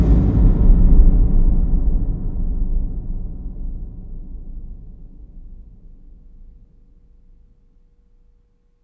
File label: DISTANTDRU, AMBIENT DRMS; Spectrasonic Distorted Reality 1